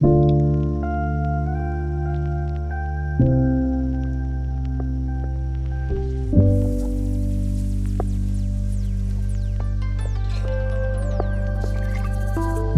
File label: lofi hip hop